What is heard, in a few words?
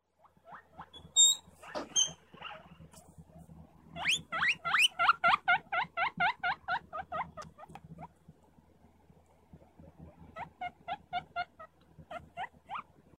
Wheeking